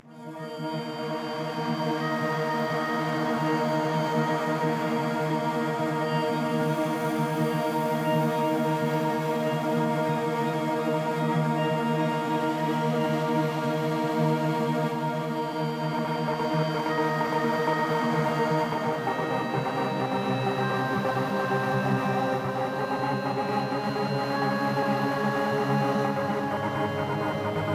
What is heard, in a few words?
Ambient Mix